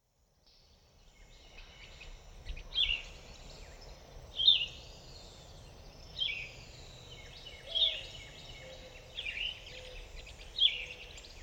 Yellow-throated Vireo, Vireo flavifrons; vireos

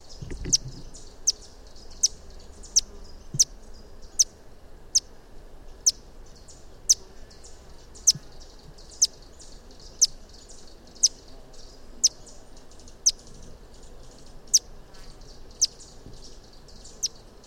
Rivolis Hummingbird, Eugenes fulgens; hummingbirds

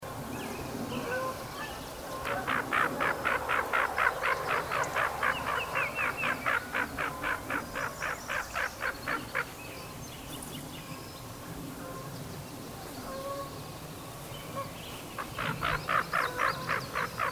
Hooded Merganser Lophodytes cucullatus; waterfowl

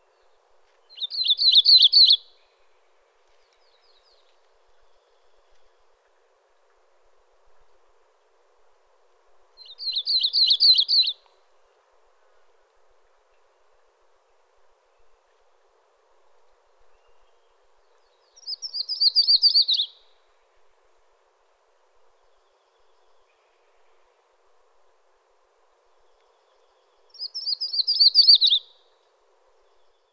Yellow-rumped Warbler Audubon Setophaga auduboni; warblers

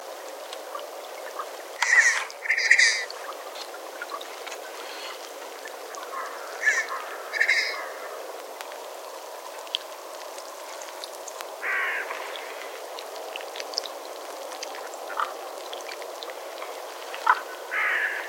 Red-breasted Merganser Mergus serrator; waterfowl

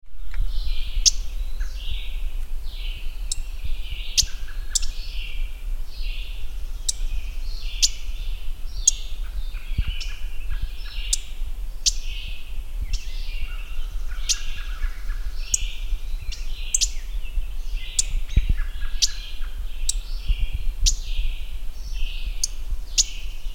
Mourning Warbler Geothlypis philadelphia; warblers

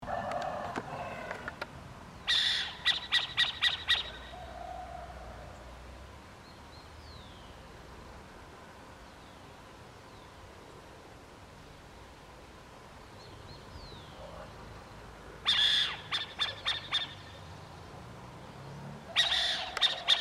Burrowing Owl, Athene cunicularia; owls

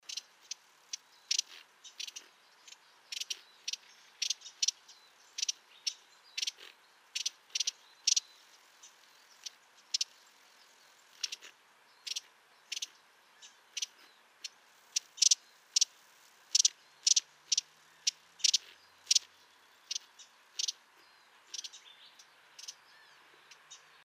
Broad-billed Hummingbird, Cynanthus latirostris; hummingbirds

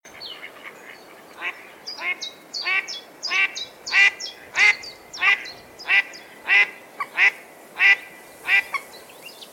Mallard Anas platyrhynchos; waterfowl

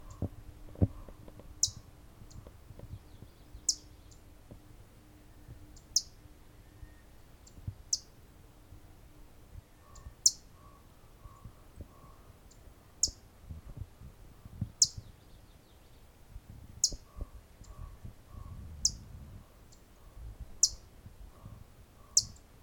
Blackpoll Warbler Setophaga striata

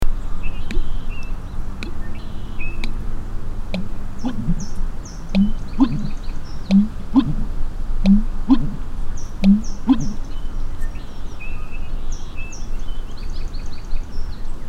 American Bittern, Botaurus lentiginosus; wading largewater

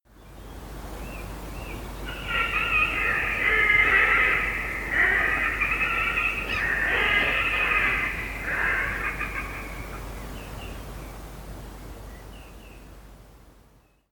Red-necked Grebe Podiceps grisegena; waterfowl

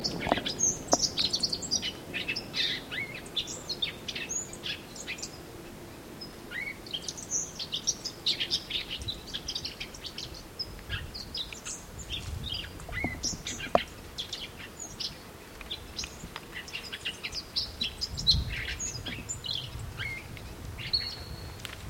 Lawrences Goldfinch, Spinus lawrencei; finches

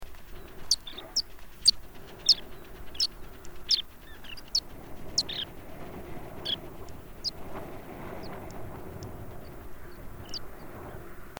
White-rumped Sandpiper, Calidris fuscicollis; shorebirds rails

